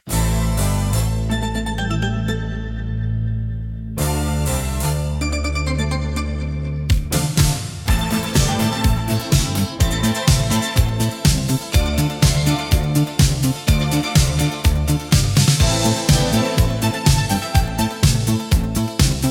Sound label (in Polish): spiewajace zyczenia disco; Styl DISCO; radośnie i skocznie!